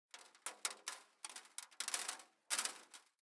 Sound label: ambient; World of Tanks